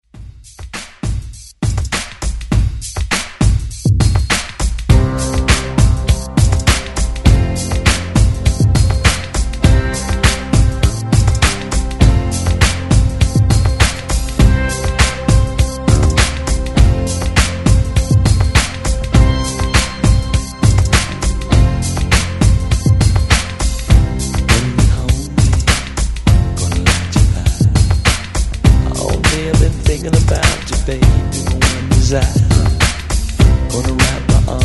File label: 70s ReDrum 101Bpm; DJDOLLS